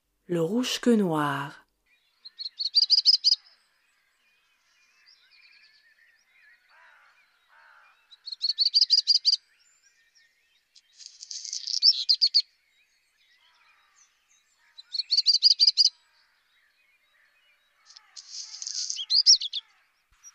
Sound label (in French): Rougequeue Noir